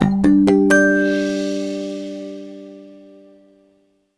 Jingle Puzzle Solved; Rayman 3: Hoodlum Havoc

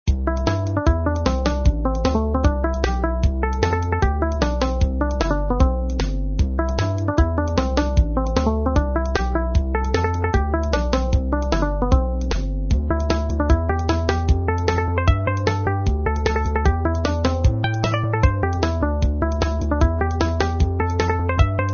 Loop Fast

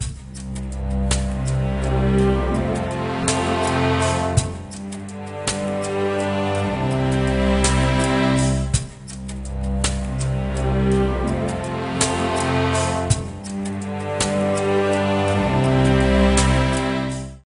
Jungle Level

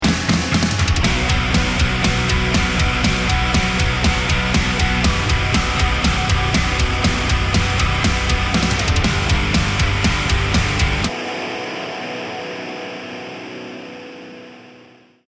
bomb ten sec count

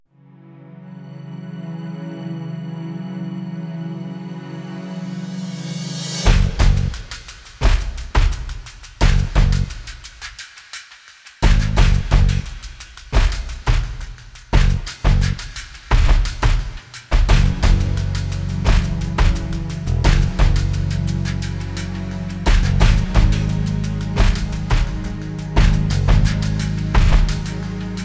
Reward for Victory; Yakuza

